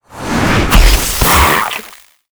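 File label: 4 leg skeleton attack, monster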